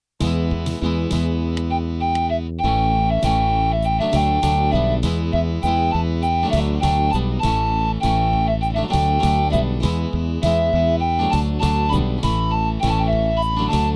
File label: FLute Part, Midi